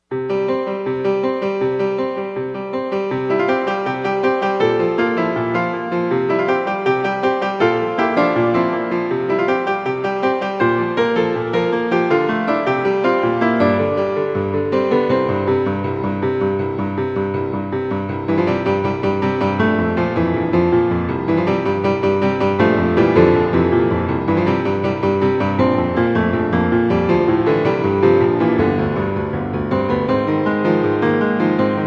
Prelude in C Major, Solo Piano

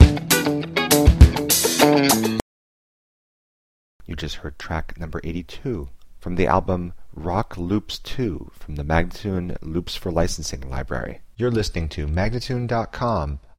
100 E spoken, Rock Loops

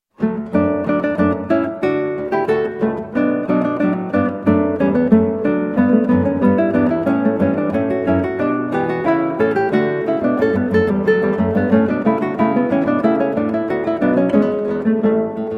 March, Guitar; Trio Sonata in D Major HWV 397; George Frideric Handel

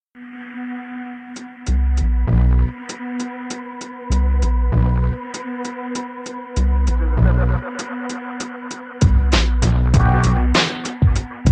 beat

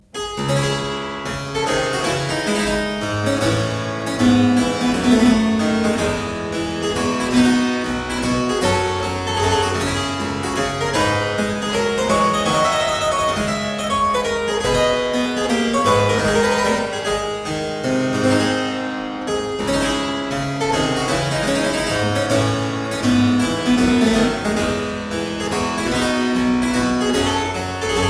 Suite no 1 in D Minor - Courante, spoken; J Mattheson; Johann Mattheson - CD1 - The Twelve Suites of 1714

